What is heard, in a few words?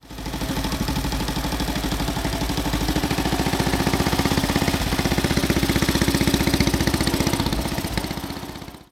Triumph Model P